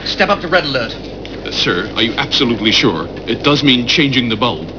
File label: red alert